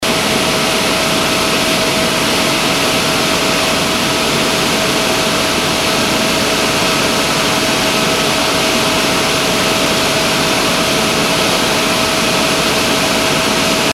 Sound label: aircondition